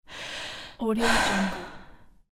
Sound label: exhalation